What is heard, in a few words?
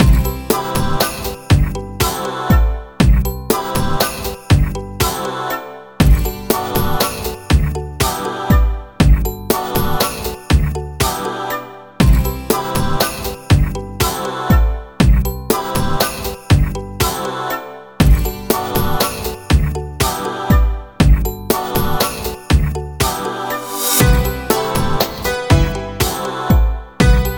forest; Mana Khemia 2; PS2